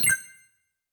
confirm, tones; inteface sfx pack 1

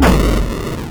Retro Explosion Short

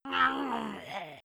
EnemyDie; Basic Game Sound Effects Pack 1

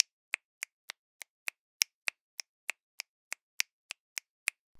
Finger Snap Rhythm; Sample Growing Stream 002; Ear Candy

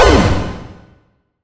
Retro Event Wrong Simple

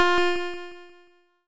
Retro Blip; Alarms Blip Beeps; GameSFX

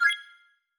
confirm, tones; inteface sfx pack 1

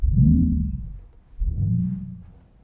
Woom, chairmat; Micro Pack

